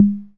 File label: pops con, Korg MiniPops; DRUM MACHINES